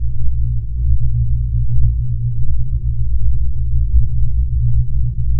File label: wormhole effect idle; Earth and Beyond